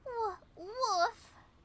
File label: Woo.. Woof; Momiji